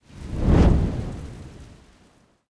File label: fire Shoot; netent; magicportals